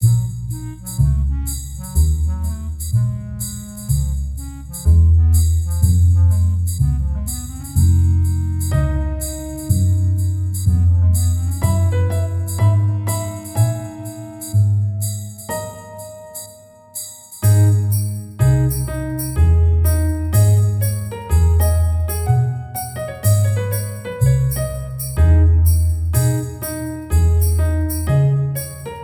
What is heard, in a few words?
icy cold blues piano